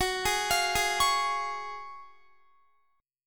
F sharp Major 7th Suspended 2nd, F sharp, down, Guitar; arpeggiated; JGuitar